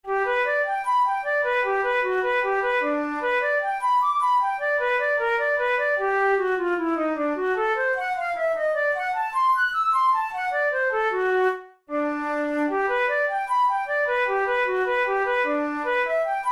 study in g major, flute tunes; kohler op33 no1 study no2

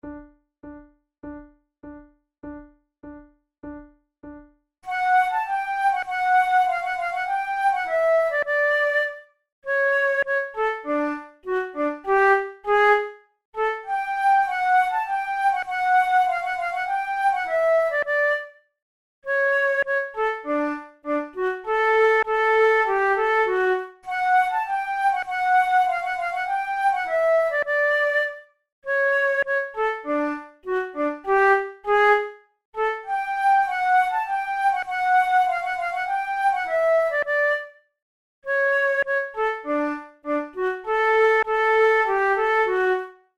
methode de flute; tulou

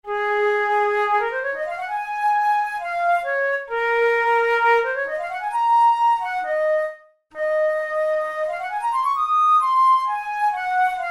studies for the boehm flute; drouet 72

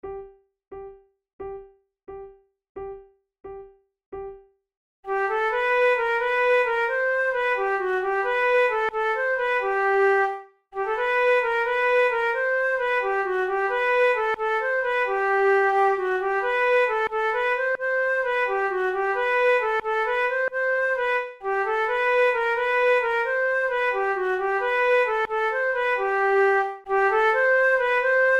duet

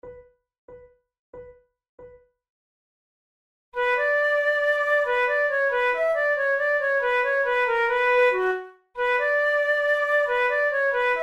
gigue, flute

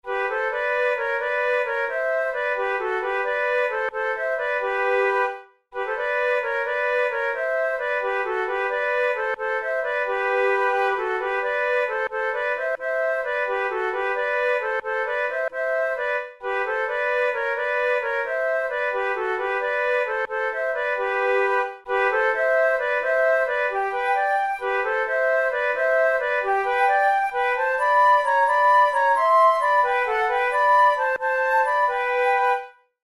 duet

